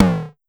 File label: Pollard Perc, Syndrum